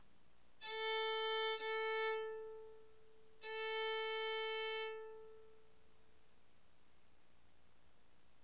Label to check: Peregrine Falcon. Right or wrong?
Wrong